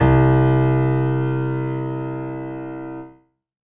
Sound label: keyboard acoustic, acoustic grand piano